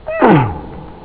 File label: uhhh, speech; OctaMED SoundStudio V1